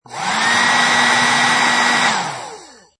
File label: Heat Gun Light Short, Hot air heat gun, short shot, low power (first level); Machines; Lynne Music